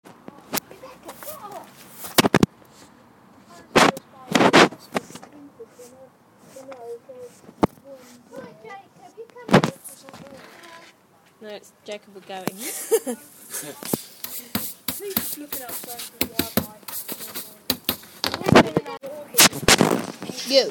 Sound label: sweeping